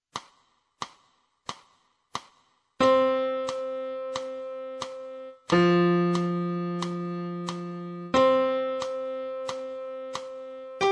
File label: piano; Notas na Partitura